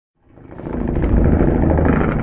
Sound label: Godzilla sound